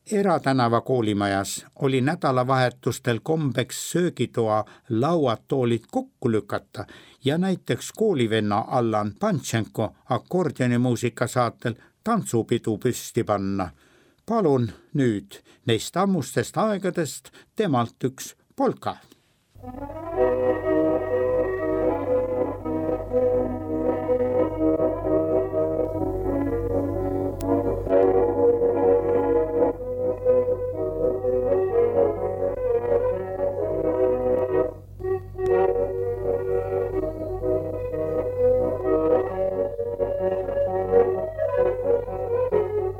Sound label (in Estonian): Polka, akordionil